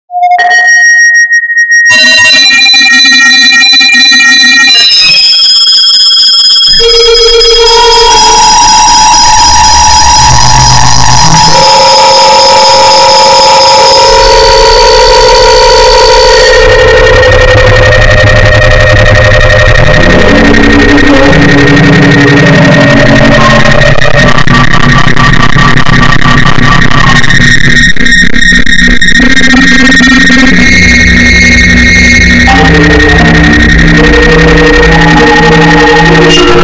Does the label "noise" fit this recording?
Yes